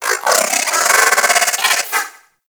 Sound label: Riot Moa Vocal Attack; Warframe